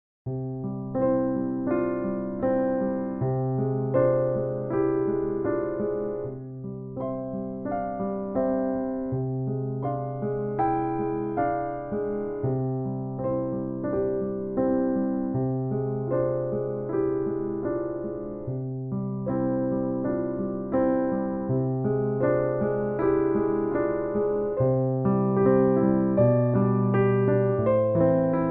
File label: play piano